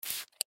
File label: Bottle Spray, Bottle Spray Single Squirt Fluid Bottle; Spraying; Foley; Blastwave